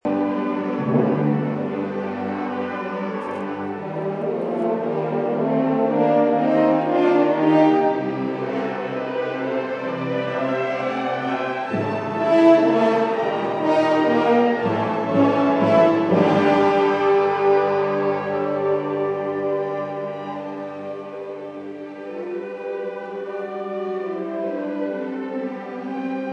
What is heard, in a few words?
concert orchestra; fh croydon